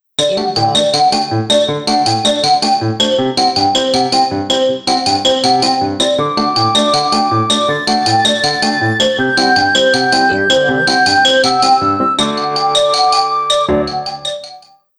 Cute

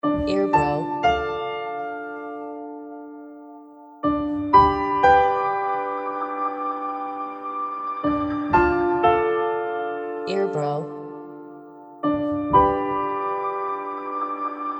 Piano

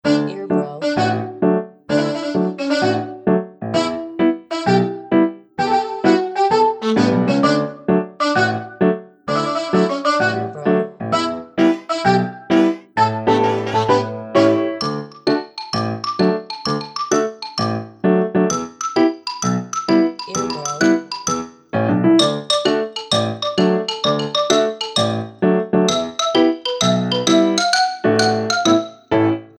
Poop Poop Song

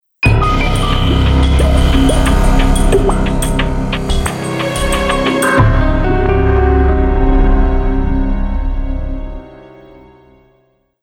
Murder Mystery

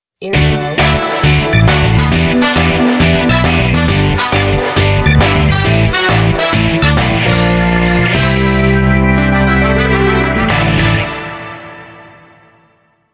Happy Point

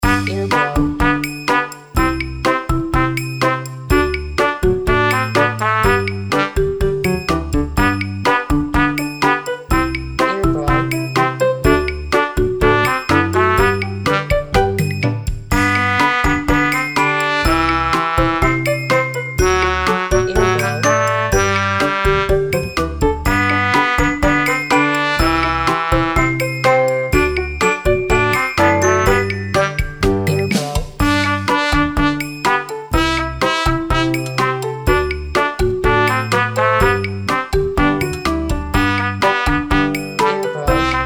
Trumpet Song

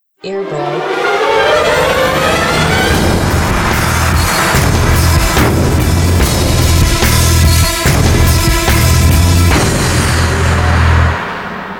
Epic Duel

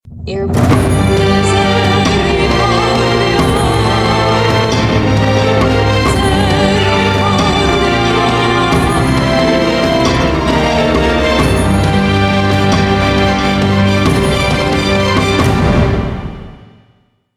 Cruel Suspense

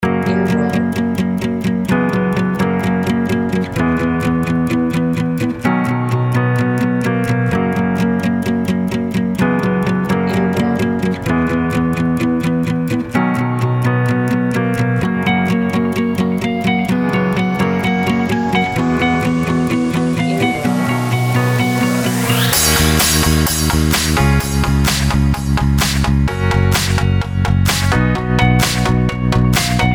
Instrumental

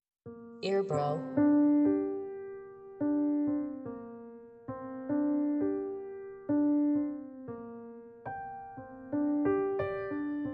Piano Debussy Reverie

